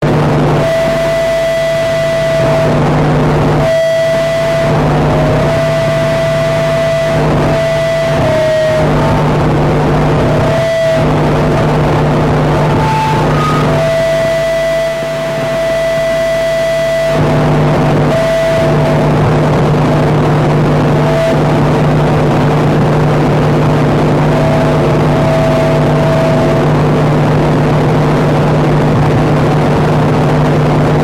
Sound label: four distortion pedals in at once feedback